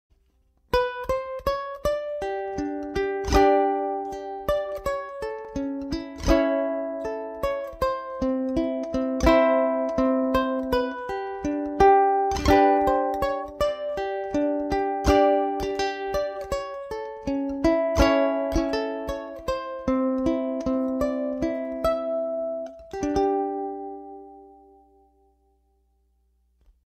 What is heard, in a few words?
Ukulele